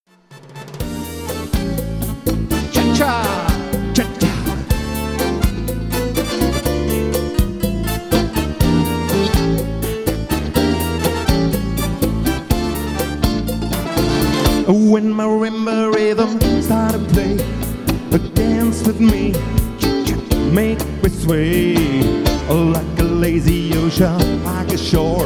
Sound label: Cha Cha